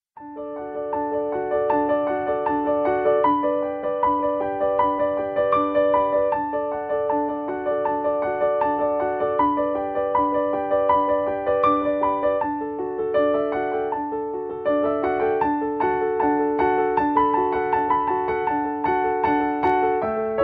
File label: endlos mozart